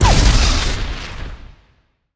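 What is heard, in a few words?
bomb explosion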